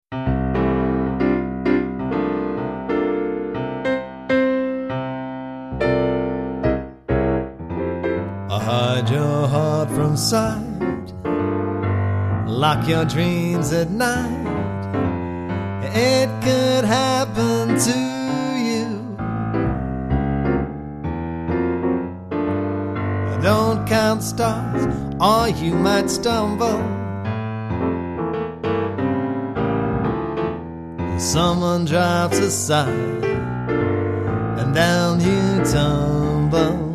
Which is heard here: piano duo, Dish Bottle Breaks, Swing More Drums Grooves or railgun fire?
piano duo